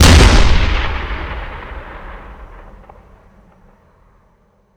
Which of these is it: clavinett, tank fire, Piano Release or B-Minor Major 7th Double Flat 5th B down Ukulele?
tank fire